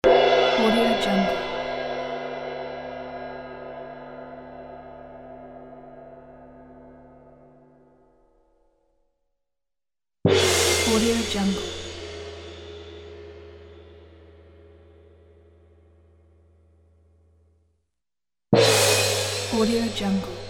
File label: gong